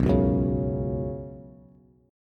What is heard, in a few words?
B Suspended 2nd B down Bass, Bsus2 chord; strummed; JGuitar